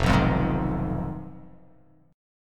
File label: F Minor 7th Flat 5th F down